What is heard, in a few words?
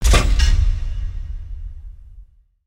Elevator Begin